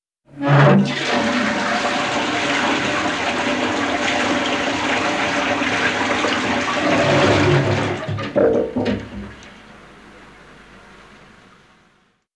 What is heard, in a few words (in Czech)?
záchod